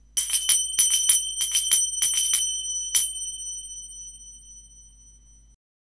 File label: nefertiti german silver zils